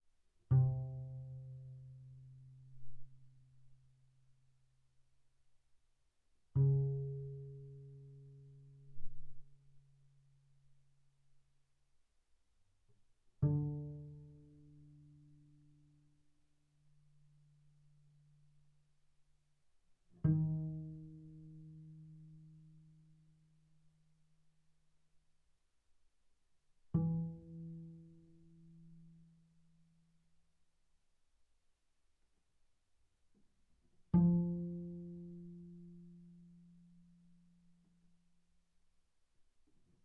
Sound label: Bass pizz sul G mf C3 B3, double bass